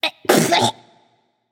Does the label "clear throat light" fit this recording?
Yes